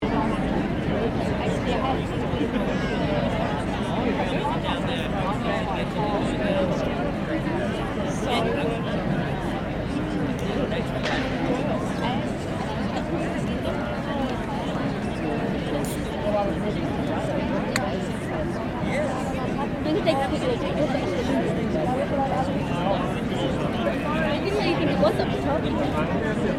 Crowd Large Outdoor Event Chatter, Outdoor event crowd; Crowds